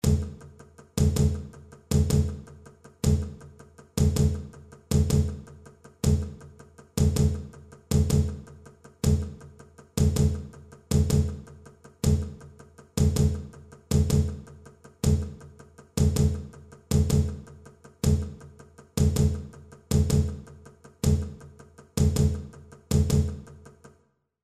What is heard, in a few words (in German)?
ZWEI CAJONES groove; stueck fuer 2 cajones